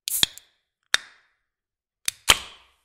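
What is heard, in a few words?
soda opening, can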